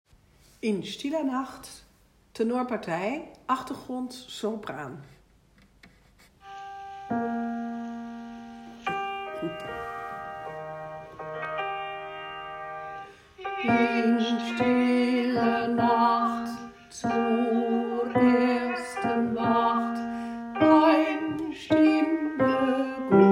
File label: in stiller nacht tenor